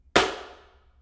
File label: table foley; DoomRTX